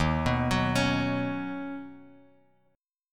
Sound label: D sharp Minor 7th Sharp 5th D sharp, down, Bass Standard; arpeggiated; JGuitar